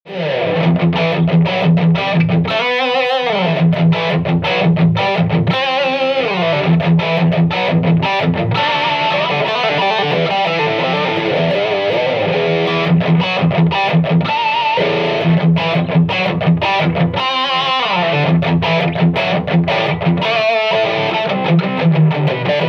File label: Bridge Dirty